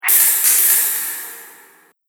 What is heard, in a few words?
Thin Metal Hits; Impact; Andrew Fleming